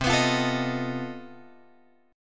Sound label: C Minor Major 13th C